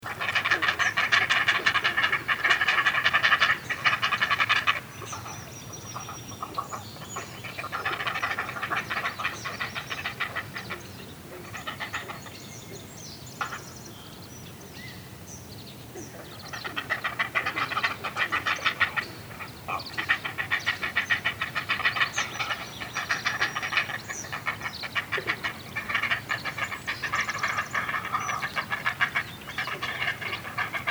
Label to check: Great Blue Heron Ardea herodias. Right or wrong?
Right